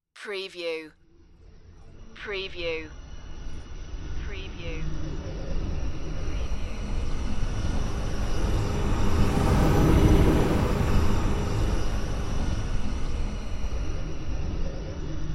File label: rising flyby swooshes